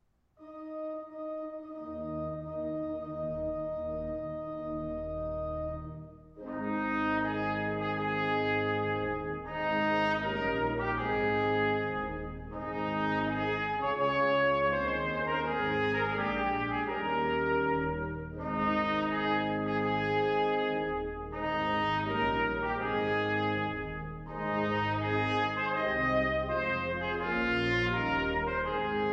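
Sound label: wedding march trumpet and organ; weddings; wagner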